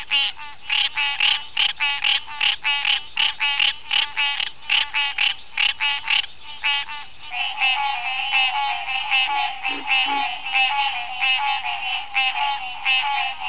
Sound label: green tree, frogs